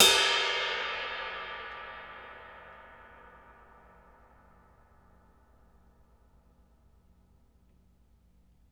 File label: ride Hi